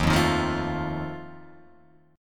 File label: Eb Suspended 2nd Flat 5th, Guitar, Open F; strummed; chord; JGuitar